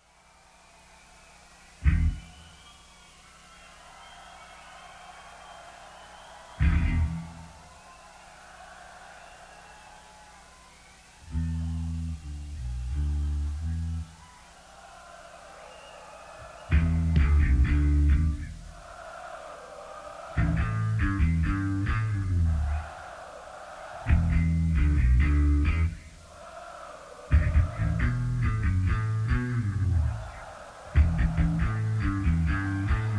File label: Encore Jam Live; Hammersmith Odeon, London, England